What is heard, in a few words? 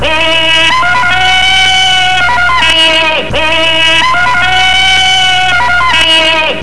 Tarzan